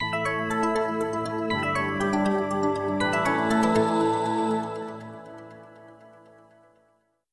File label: PowerOff; LG Viper LS840; SprintLTE